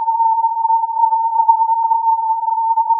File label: filtered input